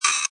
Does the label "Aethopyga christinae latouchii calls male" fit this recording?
No